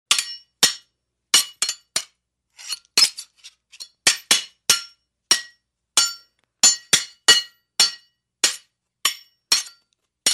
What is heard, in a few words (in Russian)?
srazhenie dvoih raznye zvuki metalla est zaklinivanie; Звук мечей; Звуки сражений на мечах, звук ударов меча